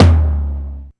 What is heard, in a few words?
Tom, LinnDrum